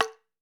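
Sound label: Mexican TAMBO, Latin Shakers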